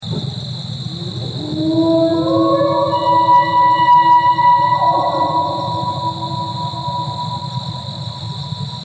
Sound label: Elk